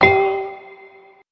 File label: dinnerbell, Synths; Notes